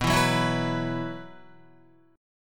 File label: B Suspended 4th, Guitar; Bsus4 strummed